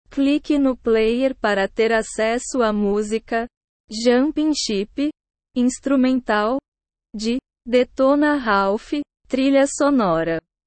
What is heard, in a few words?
instrumental